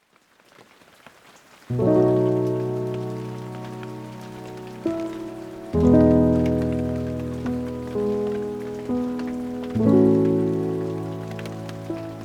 rain sounds

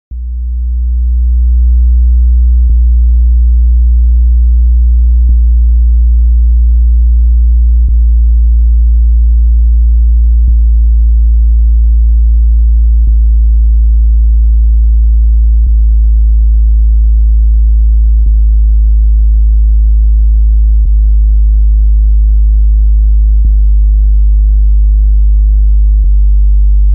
bass test without voice

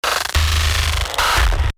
uzi hit complex short

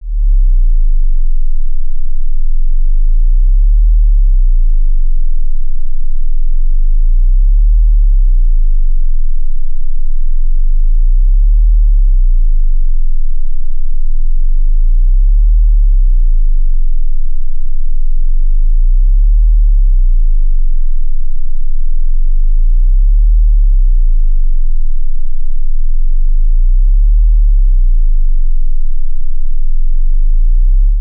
bass test 40 15 hz

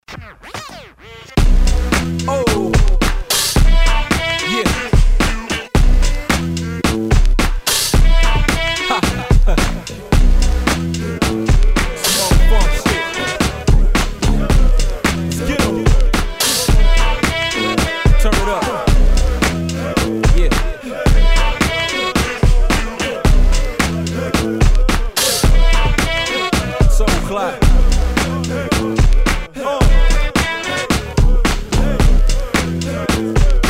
dirty guitar instrumental

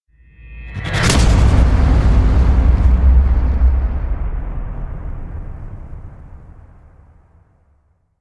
destruction slams cataclysm with whoosh

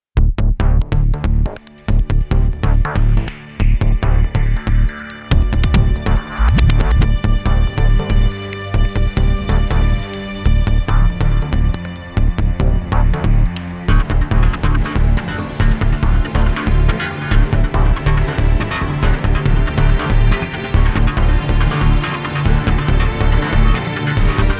no choir no percussion

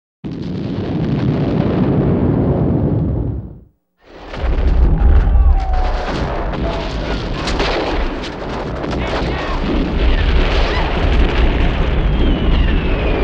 explosion and bottle

